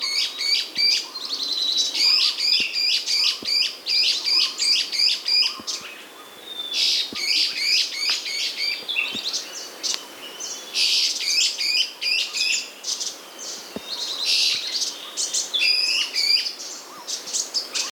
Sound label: Blue winged Laughingthrush Trochalopteron squamatum